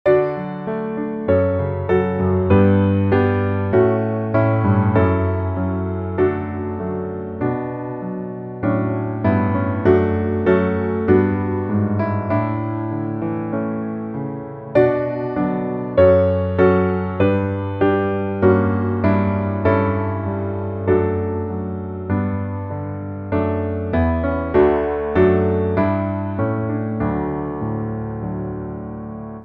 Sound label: piano